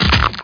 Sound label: break; CD Action 36; Wcw